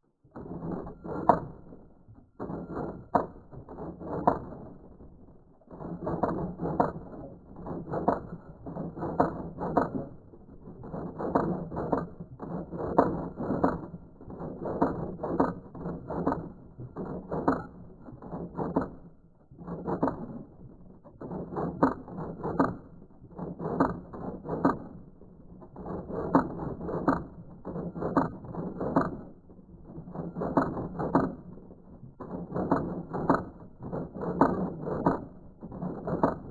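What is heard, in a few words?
typewriter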